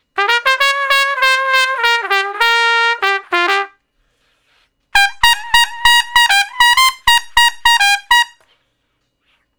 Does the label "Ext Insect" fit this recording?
No